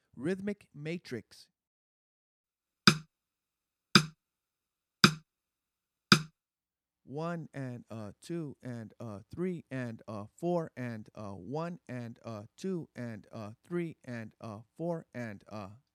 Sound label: Rhythmic Matrix; bembe conversations